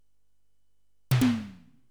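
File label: percussion, house, 125; eJay; Drum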